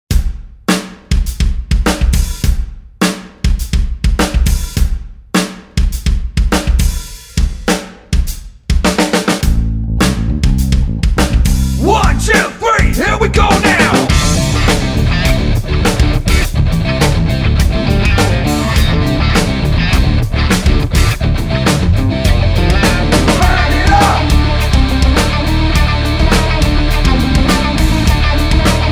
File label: music jingle